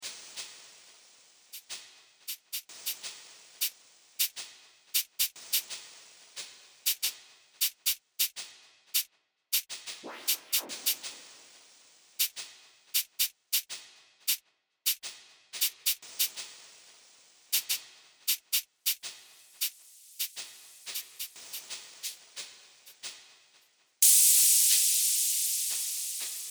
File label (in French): percus neige cave